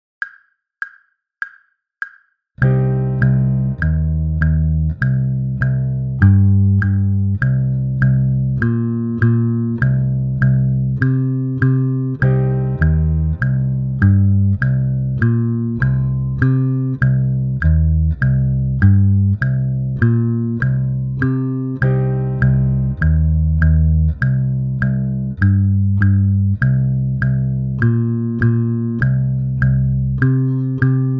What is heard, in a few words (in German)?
Viertelnoten in C Moll, bass